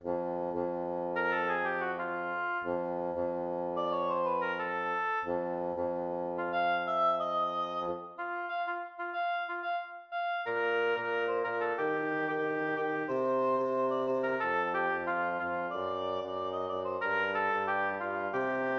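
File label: jazzy we wish you a merry christmas oboe bassoon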